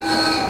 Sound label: dc110 whistle; tetsuon